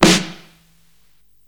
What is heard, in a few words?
Snare, Psychedelic Drums